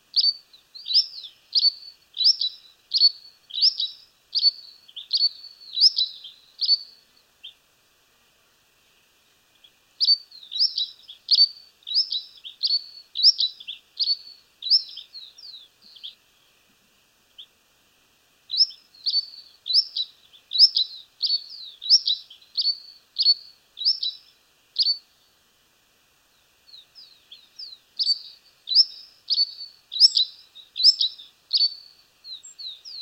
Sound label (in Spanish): Comesebo, sonidos de la patagonia